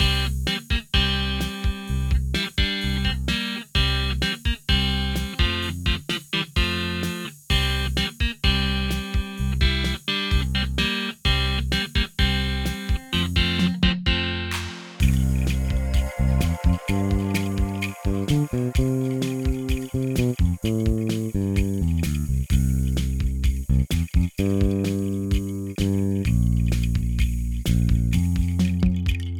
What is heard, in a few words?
Beach Upbeat; Golf Story